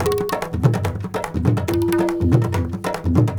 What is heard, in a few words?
INDIA L; Spectrasonics - Heart of Asia CD2